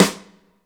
CRAM SNARE; InVision Interactive; Lightware VOL-1

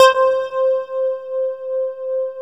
ANALO BAS, ANAL BASS

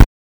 Moog BD; Moog Modular55

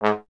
Trombone G#; Miroslav Vitous - Solo Instruments VOL-1

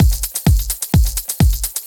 Clubhouse Loop 128 BPM

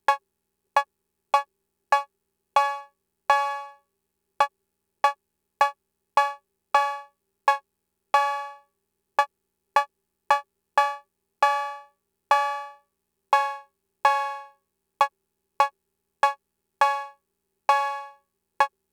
Fricke MFB-522 COWBELLS

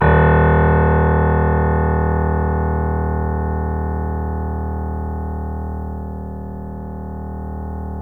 D#, The Piano; InVision Interactive - The Piano

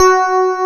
FM G3, ELECTRIC PF, Piano; AKAI S6000 CD-ROM - Volume 1

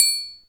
DM5 Perc, Alesis DM5 drum machine

